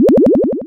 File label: Spin sine wave